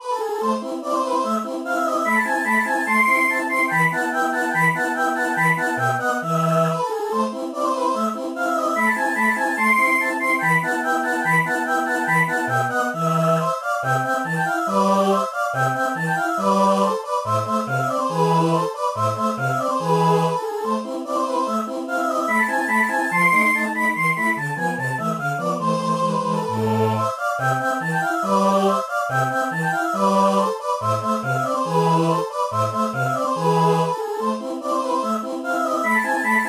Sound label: echo drops